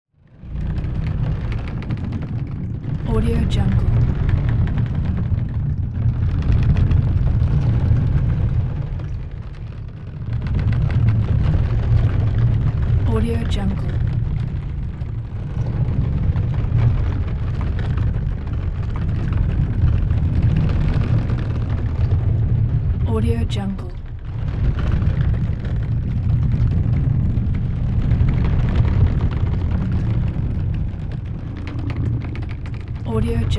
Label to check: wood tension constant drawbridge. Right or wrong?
Right